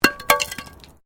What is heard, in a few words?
Metal Light Movement Minor; Materials